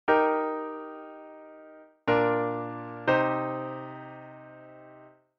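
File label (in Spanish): Cadencia Rota V a Vi, Eb; auditivo de cadencias autenticas plagales rotas y semicadencias; Identificar la cadencia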